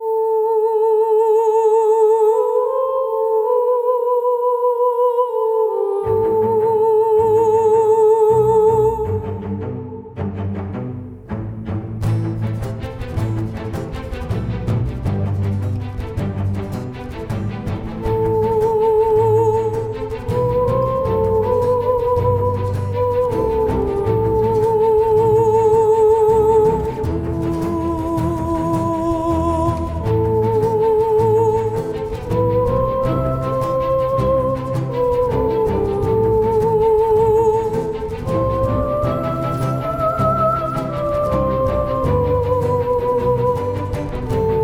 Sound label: Streaming series with strings and Soprano